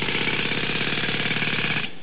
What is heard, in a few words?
jackhammer short